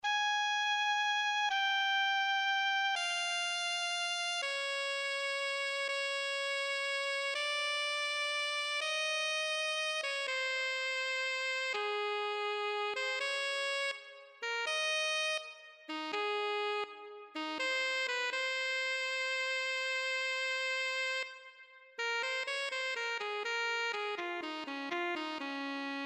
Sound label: alto saxophone